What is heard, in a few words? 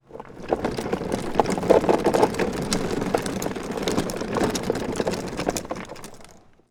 Electric Mower moving over concrete dragging scrape scraping rolling metal plastic; Electric Lawn Mower; Matt Script